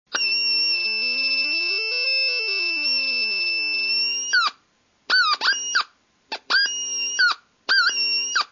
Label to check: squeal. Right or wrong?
Right